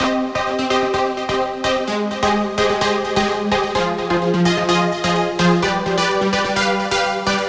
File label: House UKG Piano Cm 128bpm; 3pack piano bundle